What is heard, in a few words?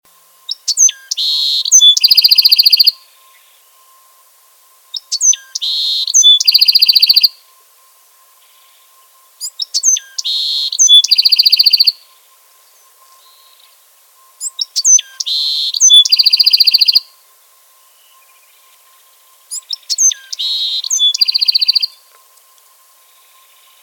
bewicks wren